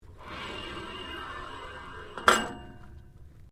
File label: MECHANICAL PULLEY METAL METAL CLANK; Variou smechanical sounds; Clanks; Objects